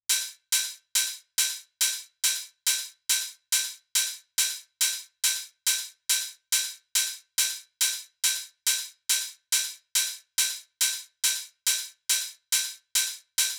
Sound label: HiHat Loop 140bpm